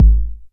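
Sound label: 909 BD tape, kick drum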